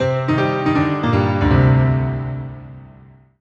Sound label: MUSIC EFFECT Solo Piano Negative; startide macos switch windows gamerip 2017